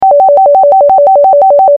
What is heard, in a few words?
Warble2